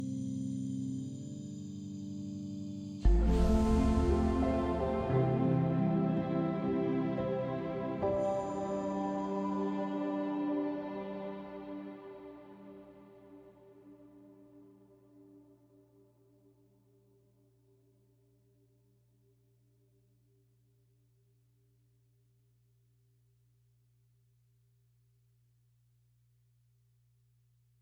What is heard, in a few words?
Cave Music Wind To End